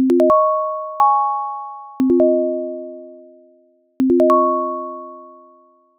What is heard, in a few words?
melodic cells